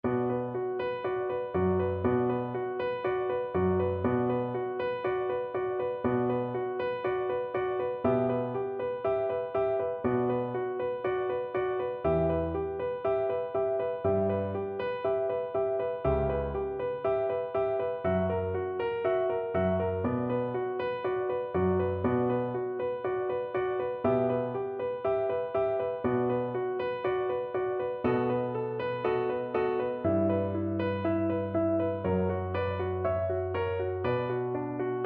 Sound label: cello